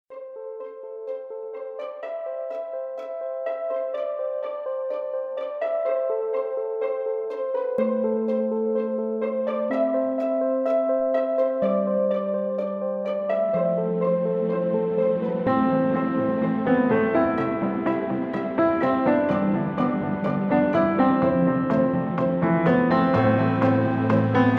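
beautiful melody for alarm clock with smooth increase of volume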